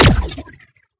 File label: Shot Small Blaster Energy Strike; Robobeat